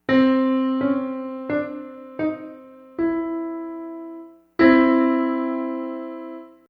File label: Maj 3 halfs